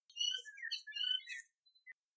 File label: Collared Kingfisher Call; SunChongHong